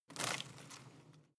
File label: home plant leaves rustle regular short